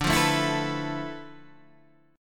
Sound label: D Minor 6th Add 9th D